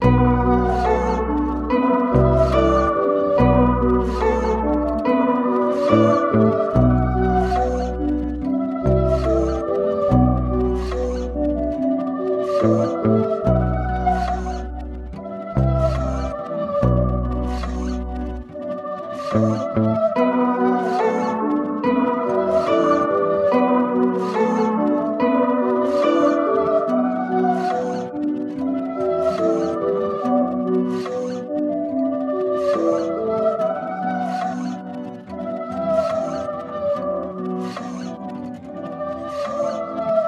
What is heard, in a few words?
143 BPM B Min, Trap; Herbs; Cymatics; Destiny Premium Melodies (BETA)